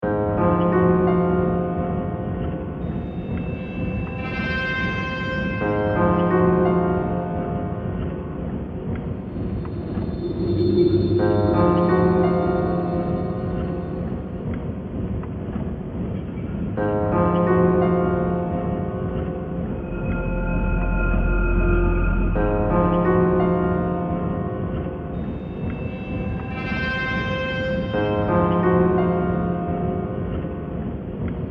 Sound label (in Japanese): Darker and Looper, 暗くて不気味な雰囲気の曲です。 ゾンビが出てきそう。